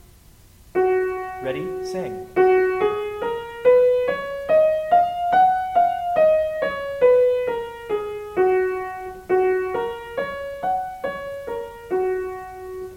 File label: Gb Major louder